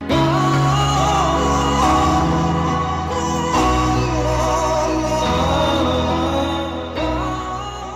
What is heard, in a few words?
Pain